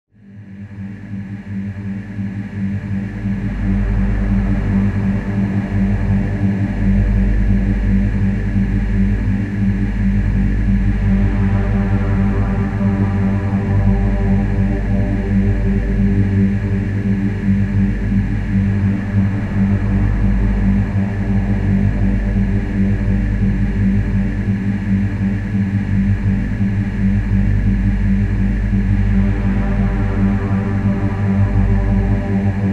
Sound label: suspenseful drone